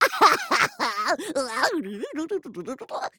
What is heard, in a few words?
FUN MONSTER Wooly cartoony laugh talk gibberish; Fun Monsters